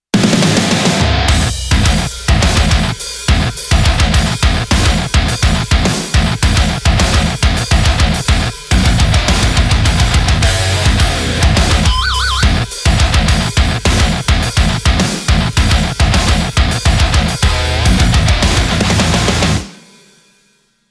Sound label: chop metalcore